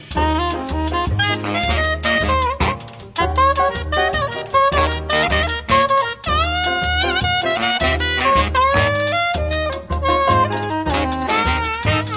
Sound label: Swingin The Samba